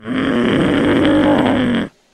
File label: new engine, Vehicles